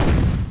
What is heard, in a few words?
fly exp; lamsword